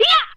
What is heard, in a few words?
eeeyah, Voice; ooh ahh